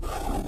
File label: Slide; friday the 13th killer puzzle